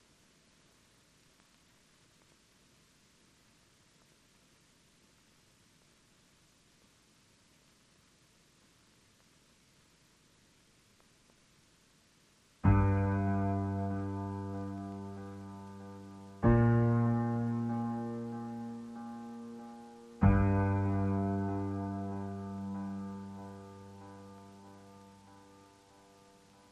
Piano

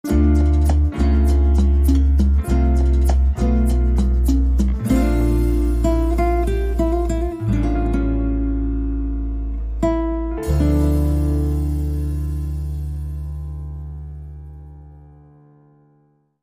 Latin Ballad

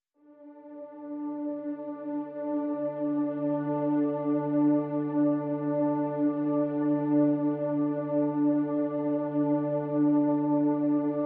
Pads Ethereal Mist (D)

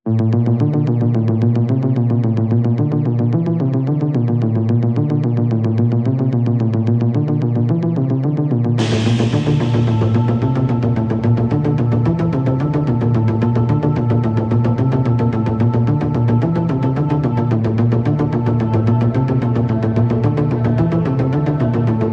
Pads and Pulses Only

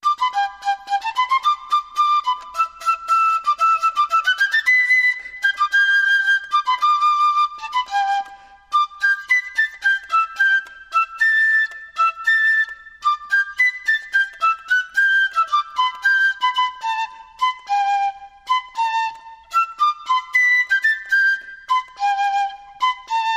Solo Fife; Dixie

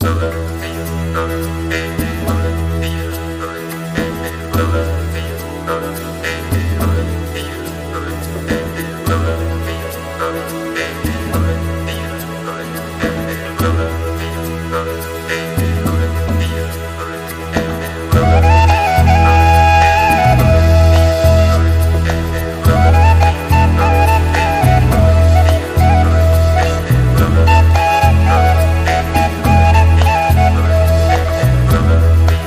Lowland Acoustic, No Vocal SFX

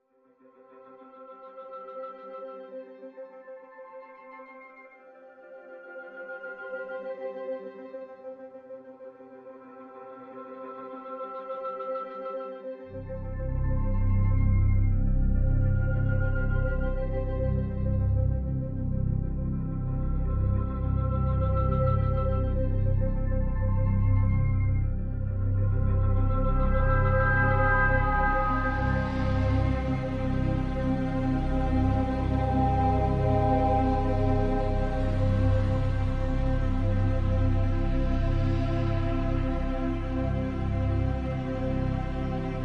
Pads Only

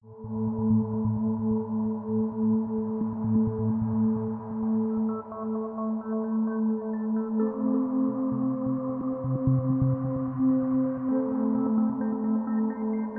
Drone, No Rhythm

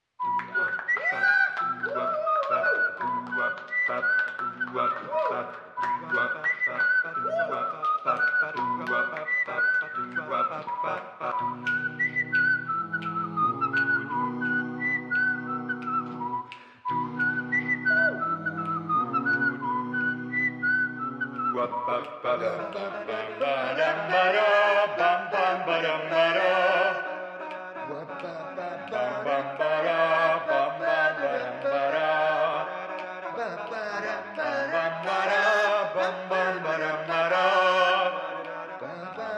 Jazz Club

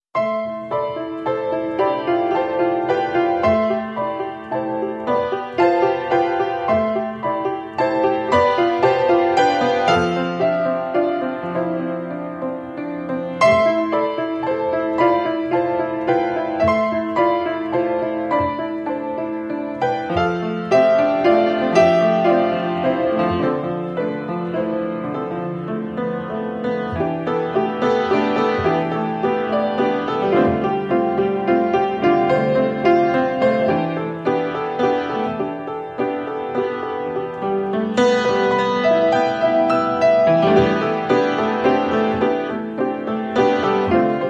Piano

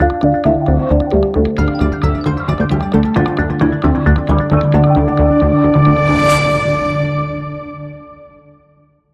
Stinger

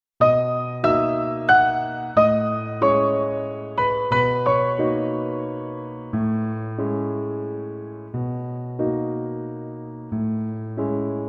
Piano Only

